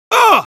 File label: painsharp, es; Heavy